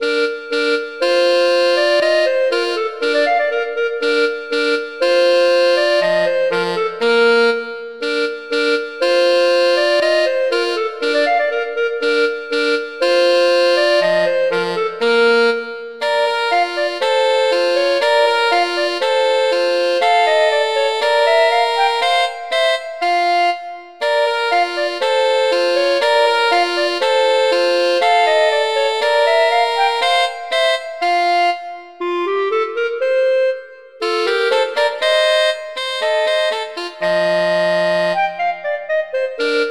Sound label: Duet; Mozart; Duets